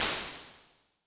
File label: small room; ChromeOS Sounds